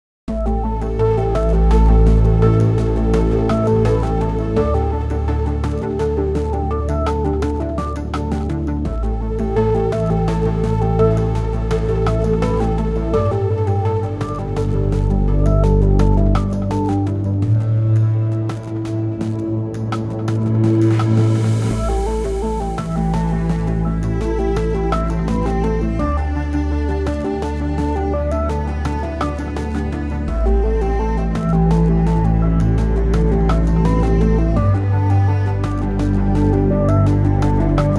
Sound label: Win Loop; Axon TD Uprising Tower Defense